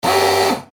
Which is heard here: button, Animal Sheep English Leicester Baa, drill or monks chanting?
drill